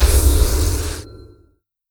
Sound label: Ray; KriptoFX; Realistic Effects Pack v4